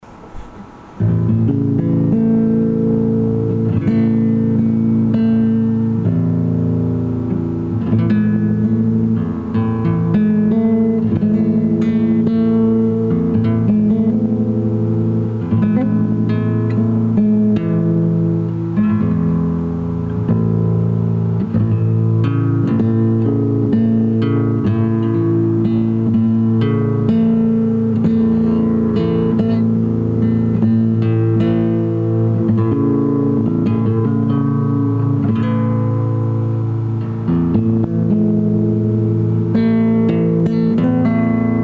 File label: Guitar